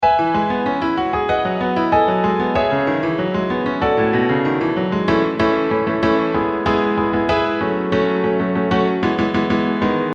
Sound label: piano compare logic effect